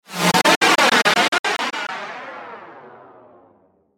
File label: stutter phase